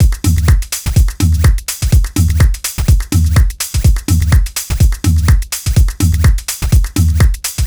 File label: Full Loop 125, Loungin House; Equipped Music